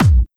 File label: soft hit whistle; osu